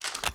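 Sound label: assault rifle reload empty fast mag in; gmod; viper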